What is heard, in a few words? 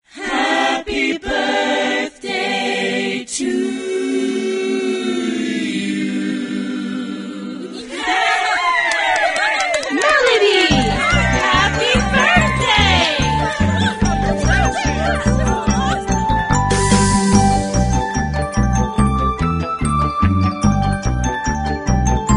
melody